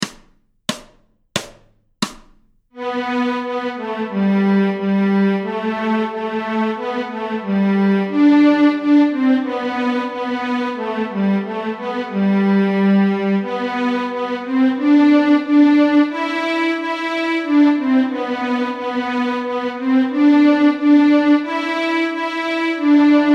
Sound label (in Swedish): Go tell Aunt Rhody Stråk G, Fiol